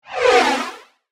Whoosh Pass By, Fast, Flange, Accent, Transition; Production Element, Imaging Element; BlastWave

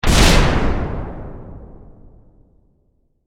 Explosion Heavy; Explosions; SFX Bible

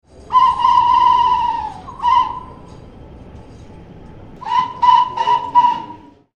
Whistle train station platform guard whistles; Whistle Sound Effects

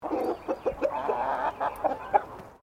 Bird Chicken Berel Clucking

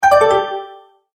Multimedia Tone-Return/Back

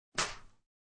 Nwsprp Hit, Newspaper hit quickly with hand; Crunches

